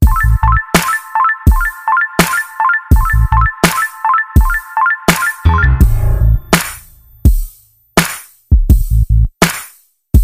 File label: type beat